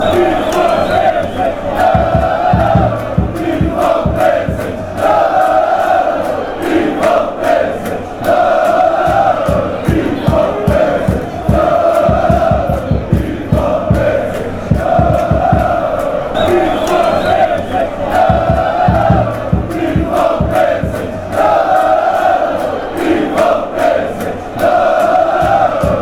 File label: club brugge chant